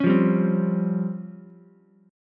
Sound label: C sharp Major 7th Flat 5th, C sharp, Ukulele; strummed; chord; 6,5,3,3